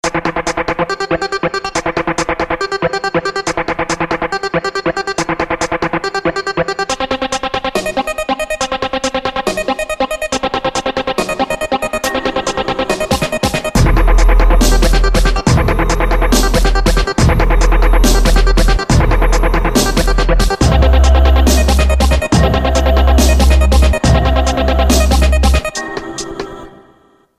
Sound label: Hearing Test